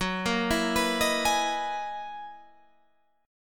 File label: F sharp 13th F sharp; F#13; 14,13,14,16,16,16; arpeggiated